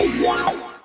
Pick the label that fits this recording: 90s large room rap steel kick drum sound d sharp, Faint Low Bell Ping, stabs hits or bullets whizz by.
stabs hits